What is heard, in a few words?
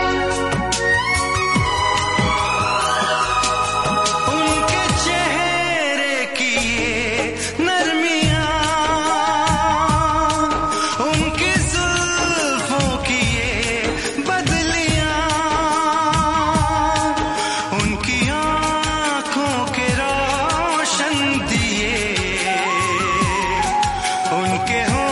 Ringtone